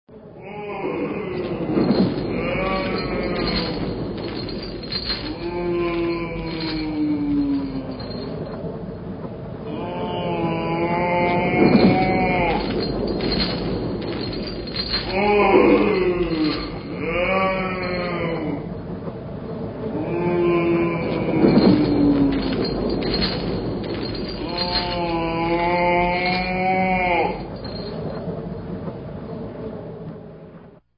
Chain Rattling with Ghost

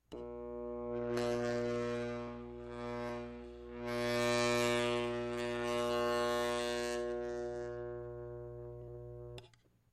electric zap

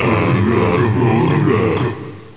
Weird Noise

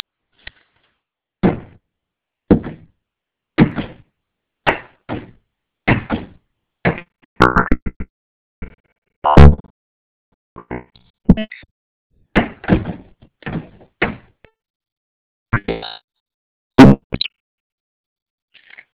Thumping

